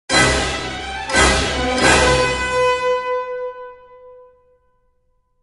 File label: battle suspense